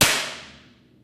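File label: physics clipboard impact large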